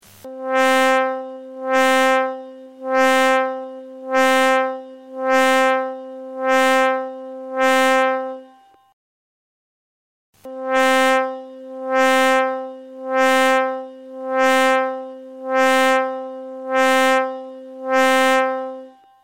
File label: WX5 EWI